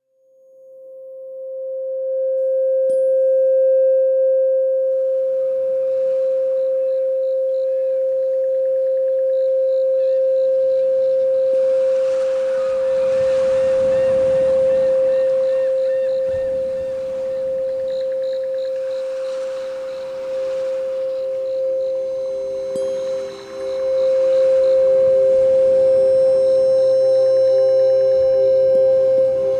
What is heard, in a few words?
528 Hz